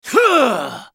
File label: spellcast effort; BlindMonk